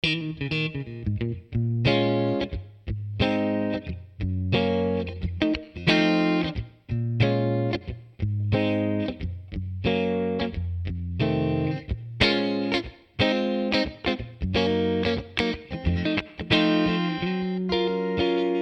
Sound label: Elec Gtr; Manley mic; manley mic comparison gold silver refc